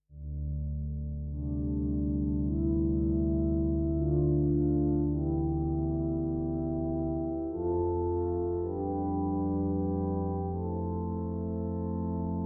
Pipe Organ, Soft Organ; labs pipe organ

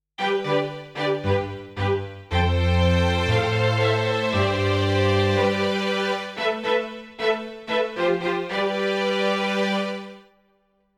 Strings, Ensemble; labs strings

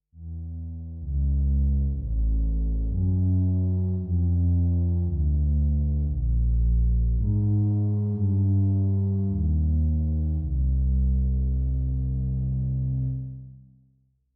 Pipe Organ; labs pipe organ; Abyss

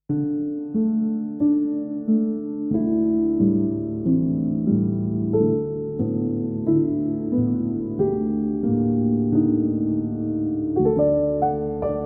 Soft Piano; labs soft piano

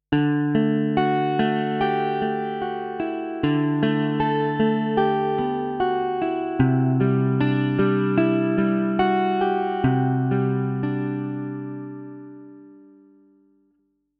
Peel Guitar; labs peel guitar

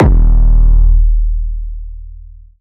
808 - 74 - D#; Heavyweight; premium 808 sample pack

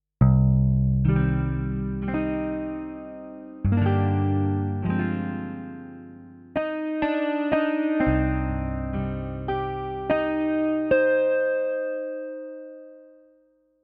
Peel Guitar Ensemble; labs peel guitar

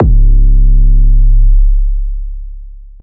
808 C#; Heavyweight; premium 808 sample pack

